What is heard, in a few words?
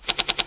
deal four